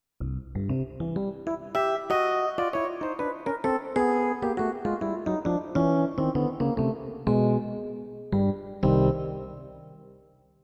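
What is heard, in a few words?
Hard El Piano, digital piano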